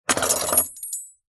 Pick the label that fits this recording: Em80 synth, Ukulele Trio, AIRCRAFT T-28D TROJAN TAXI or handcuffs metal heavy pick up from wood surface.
handcuffs metal heavy pick up from wood surface